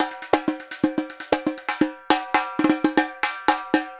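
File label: repinique, DRUMS SAMBA; Best Service ProSamples vol.14; AKAI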